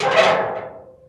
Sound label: Girder Move; Worms3D